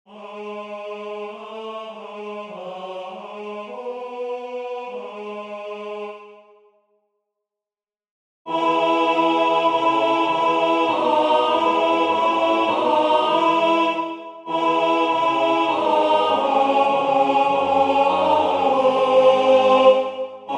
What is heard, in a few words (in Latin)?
Crux ave benedicta Alt; F.Liszt